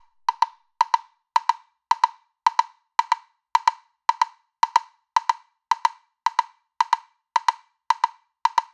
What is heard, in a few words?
woodblock loop 110